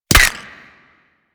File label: Ghost Expel Spirit Impact; Warframe; Priest Quest; Rell Ghost